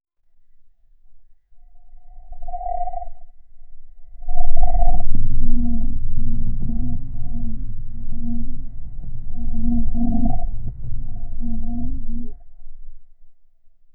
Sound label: a short audio logo built, branding